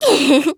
nehehe; saionji

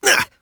pain; sonic

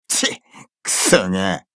glance; mondo

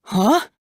huh

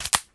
open phone; bucky

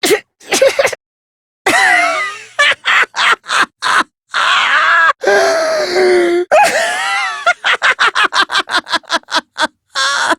laugh

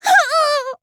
"huu"; miu

